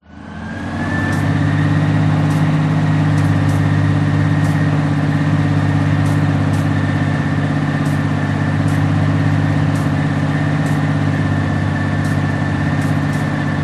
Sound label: warfare tank matilda mrk 2 1939 drive across field internal pers short